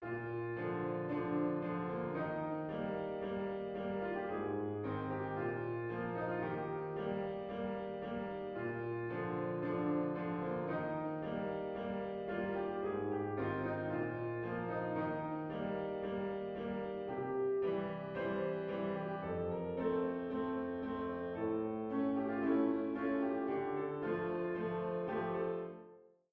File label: Piano in Bb